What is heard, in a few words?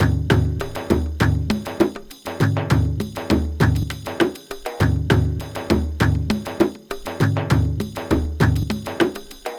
percussion hand drum